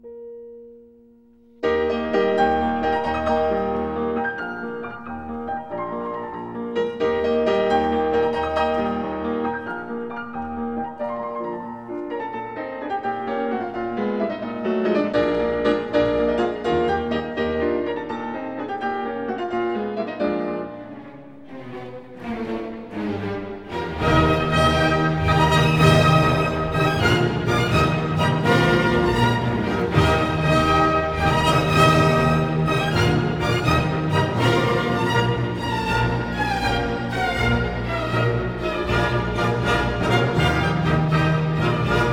Piano Concerto No 5 In E Flat Major, Rondo (Allegro); Beethoven; Op 73; Emperor